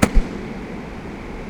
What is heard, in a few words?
Fieldhouse Air Cannon